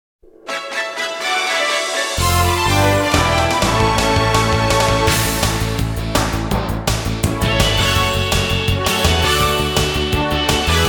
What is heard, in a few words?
complete victory; Grandia II